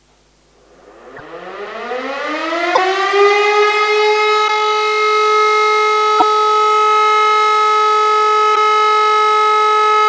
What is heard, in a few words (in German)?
Feueralarm